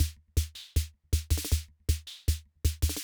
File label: Loop, rhythm king; classic machines